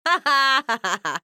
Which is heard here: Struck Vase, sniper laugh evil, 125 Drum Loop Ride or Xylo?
sniper laugh evil